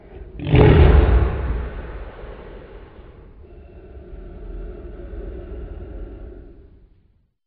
roar of beast; animal

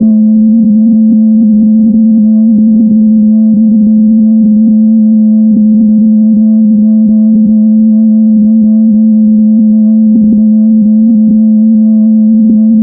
on board spaceship

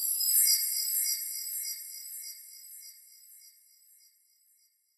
shock; animal

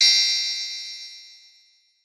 freeze

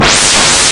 laser gun; operation

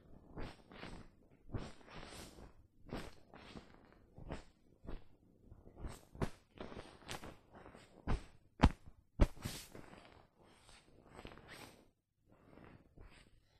rub the quilt; operation

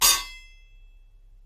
metal hit

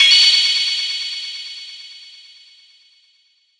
shine

